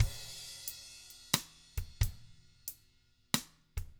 Double Platinum Drums, County

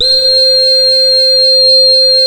BEGIN LOOP, Synth and Organ

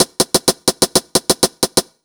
126 DRM, Disco House

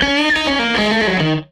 Strat Guitar Loop

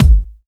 Dubstep Kicks

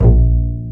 PIZZ BASSES; E-MU Formula 4000 Series Vol. 5; Protozoa; Proteus2 Instrmt

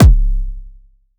kick lifeforms; Polyend; Recue

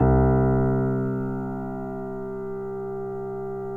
PNO PP; Synclavier Sampler Library

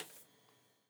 Hat Loose, Hi-Hat, Cymbals Stereo

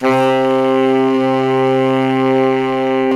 SAX, Tenors; Giga Samples Collection

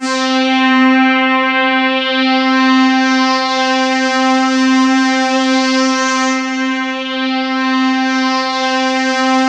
SWEEP; 90 s Sample CDs; NiceBeats; SynthLine VOL-2

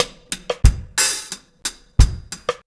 RG90 DRUM, REGGAE

